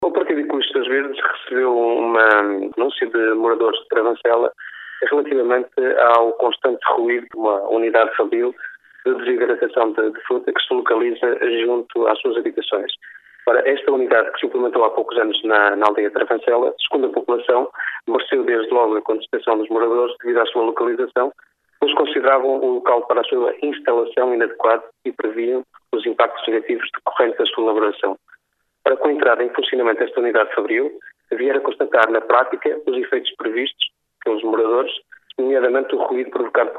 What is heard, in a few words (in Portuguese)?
ruido